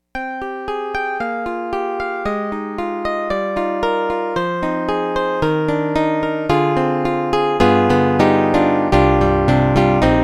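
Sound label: Roland D-110 Acoustic Piano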